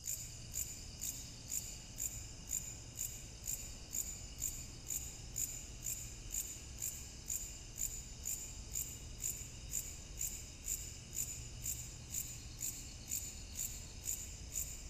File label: cave lush, ambient underground